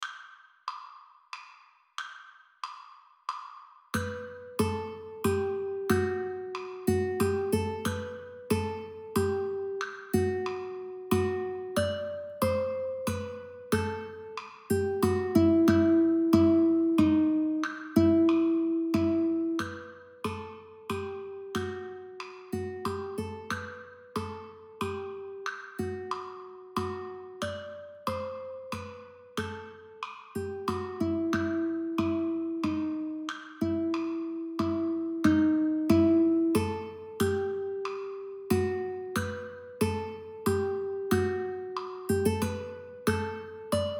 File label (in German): Hasellwoods Galliard Gitarre